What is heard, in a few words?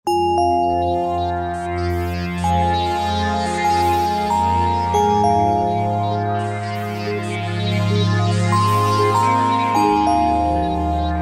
Star Bell Sweep, Korg Wavestation A-D